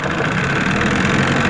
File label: movement; soldats du feu